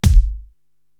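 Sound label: bass drum Clobber, Korg TR-Rack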